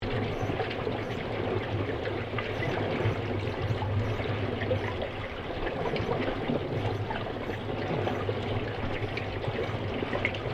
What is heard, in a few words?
Water Pool Filtration Drain Submerged Loop, recorded in a domestic pool; Various water sounds recorded in a domestic pool; Machinery